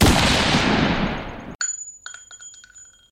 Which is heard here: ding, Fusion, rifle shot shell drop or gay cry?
rifle shot shell drop